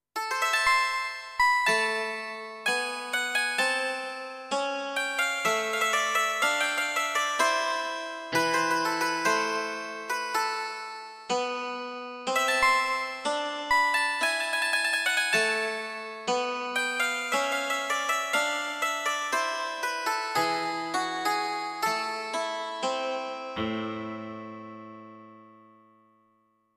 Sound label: Dulcimer